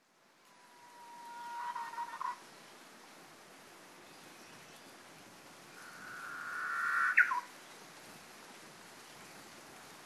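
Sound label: Japanese bush warbler, hahajima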